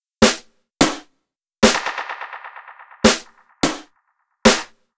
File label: Snare, Snare Patterns; Beat Components